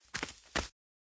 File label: Creature Sounds Walk; raycaster